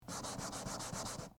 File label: draw